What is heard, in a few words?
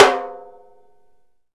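Piccolo ff Rim; EMU PX-7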